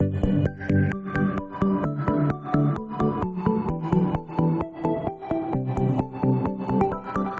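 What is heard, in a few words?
pluck g major 130bpm stem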